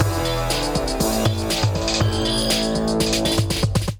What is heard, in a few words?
Street Kit, Drums; Ableton Factory Packs; Beat Tools